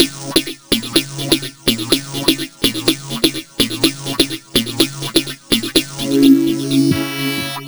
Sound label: hopskotch C 125, synth loop; Zero-G; Soundclash; Nu Dance Electro